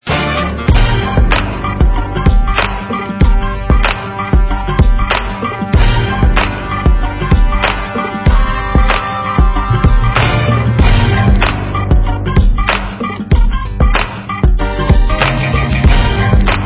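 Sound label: Instrumental, ringtone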